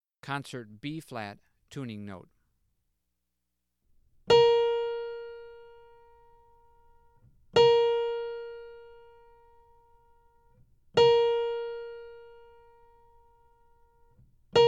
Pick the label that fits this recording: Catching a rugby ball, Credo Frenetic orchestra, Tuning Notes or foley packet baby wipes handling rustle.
Tuning Notes